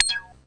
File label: zippy wave; Weird and Interesting Hits; single hits